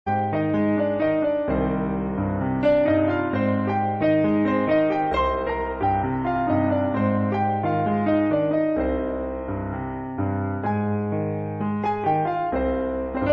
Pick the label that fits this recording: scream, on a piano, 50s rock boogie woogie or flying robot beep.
on a piano